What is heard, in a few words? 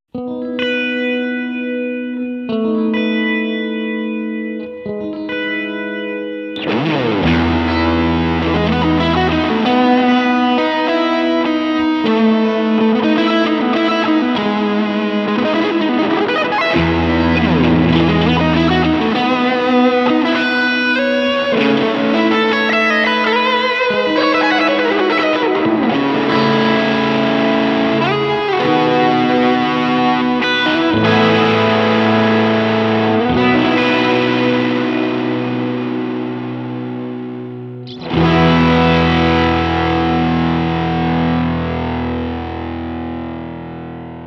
Mello Rock Tune